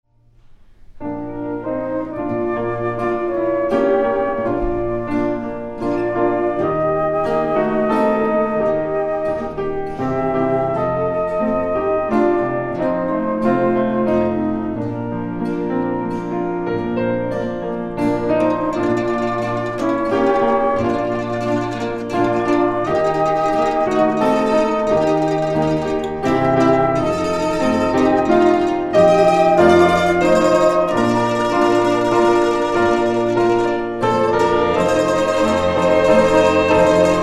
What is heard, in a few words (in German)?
Vorspiel Orchester; Das alt rauhe Kreuz